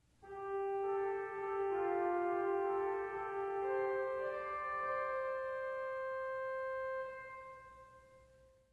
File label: Oboe